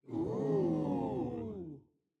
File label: audience oo